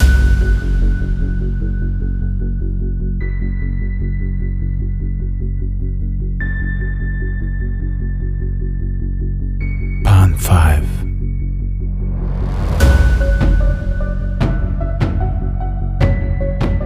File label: cinematic movie trailer film